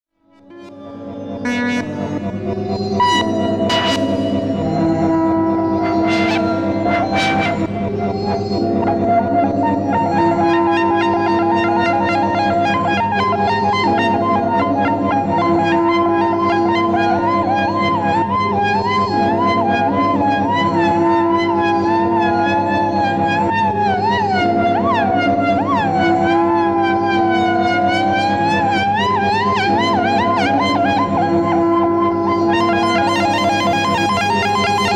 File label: Guitar